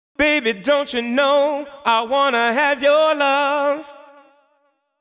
deep house male, 125, A, vocals

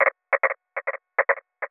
TECHNO EXTRA 140

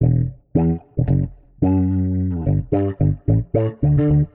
Warped Bass, Cosmic Funk; Loops; U Dont Know; Touch Loops

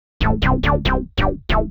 SNTH BASS ELCTR 140 A, Techno

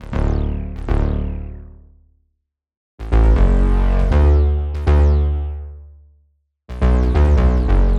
D Soakfunk, Bass Loops; Touch Loops; BlissedOutBeats

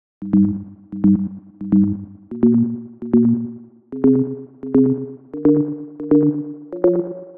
130 Gm Thin melody bass; Loops; Touch Loops; BlissedOutBeats

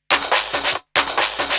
TECHNO PERCUSSION 140

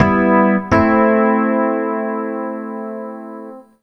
DEEPHOUSE ORGAN 125 A

